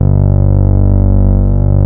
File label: Prophet 5 G2; Hollywood Edge - Giorgio Moroder Rare Synthesizer Collection